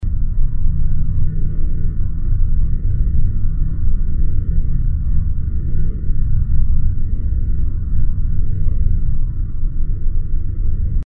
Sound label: Mech Drone